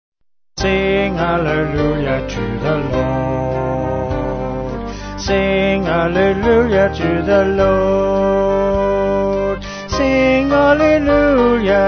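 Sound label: Vocal Band, Music Backing Files for Churches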